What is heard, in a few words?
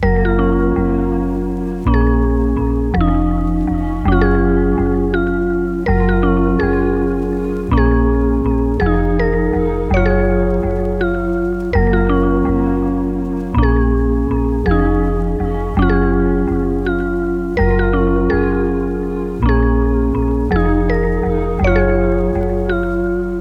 Melody; Cymatics; 9AM